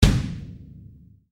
instruments drum bass drum; maoudamashii

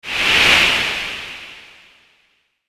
element fire; maoudamashii

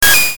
retro; maoudamashii

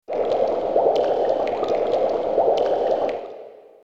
element water; maoudamashii

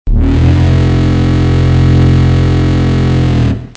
elevator; maoudamashii

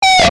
magical; maoudamashii

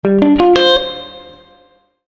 instruments guitar; maoudamashii

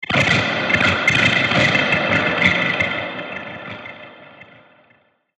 element darkness; maoudamashii

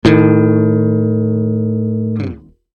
instruments bass; maoudamashii

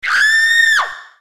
voice human; maoudamashii

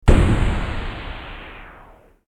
explosion; maoudamashii

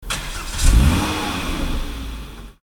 se car; maoudamashii

